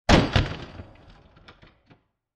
Massive Impact With Mixed Elements; Burst Sound Effects; Bursts (Sound Effects:Impacts)

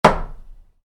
Wood hit wood, Wood, Light Impact: Wood; Materials

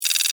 Data Hard Drive Beeps, Readout, Scifi; Tech

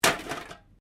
Can Softdrink Rubbish; various metal cans; Materials

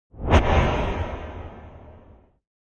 Wipe Long Tail, Fast whoosh; Fast whoosh, called a wipe, for video editing, movements, logos, flash, or animation; Wipes

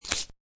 Tape Rip, Sticky office tape rip!; Offices

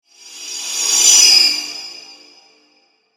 Electronic Whoosh; 5.1

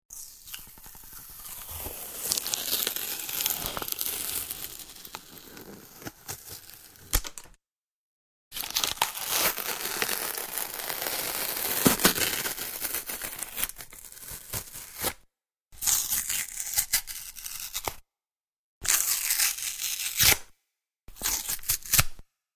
Tape Peels, Sticky tape peeling sounds.; Tape (Sound Effects:Offices)

